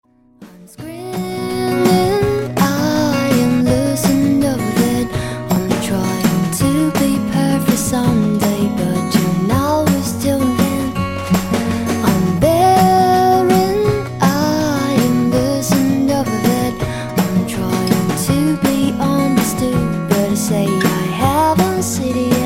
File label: desert scream